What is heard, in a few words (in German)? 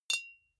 deodorant hit soft, metall; soundpool